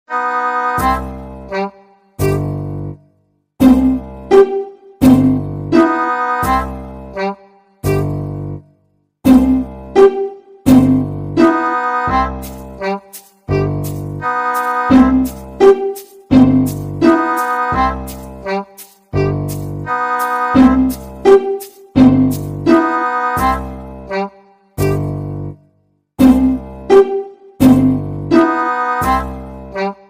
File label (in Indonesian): backsound komedi and prank ringtone